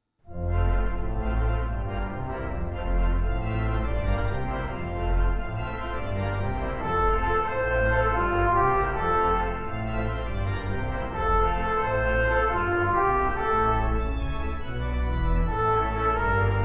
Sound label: Carillon